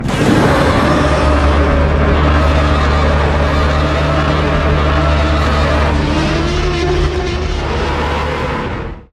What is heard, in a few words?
roar; Evolved Goji